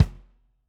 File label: Kick, drum